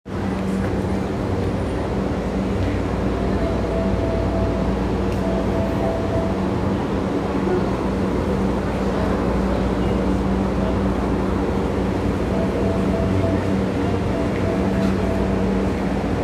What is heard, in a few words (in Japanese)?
JR横須賀線逗子北, train sounds